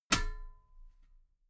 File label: metal ladder step regular